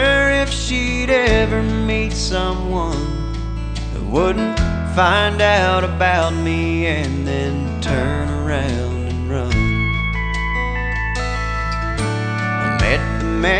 country

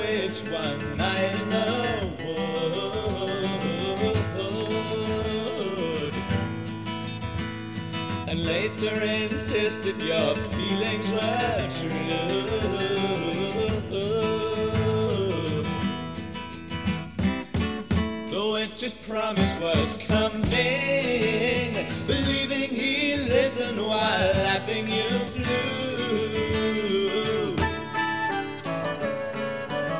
rock

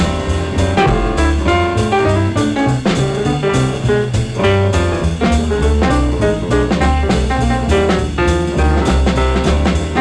jazz

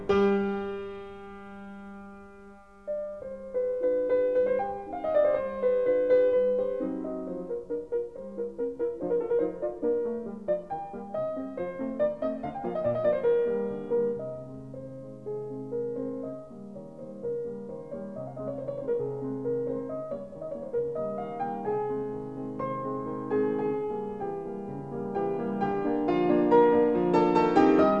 classical

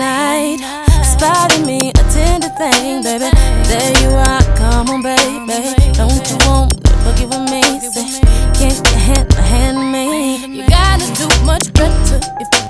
pop

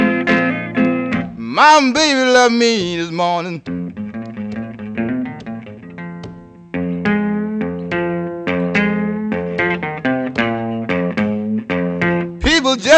blues

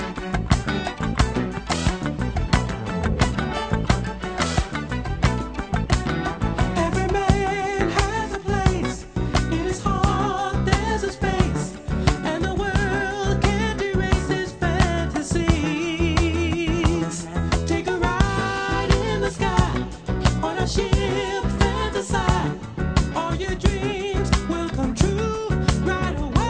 disco

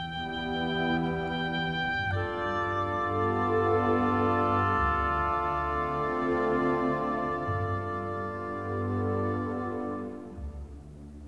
classical

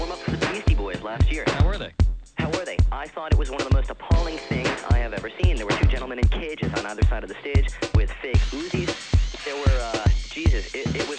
hiphop

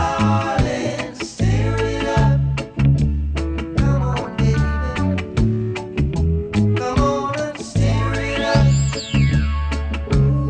reggae

